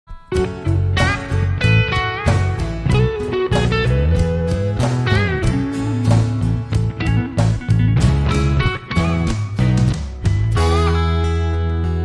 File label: Guitar S Lake